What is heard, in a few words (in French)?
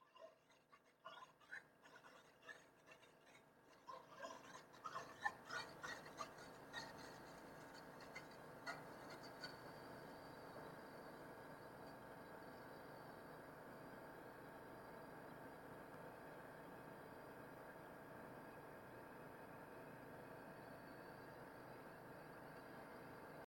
bruit demarrage compresseur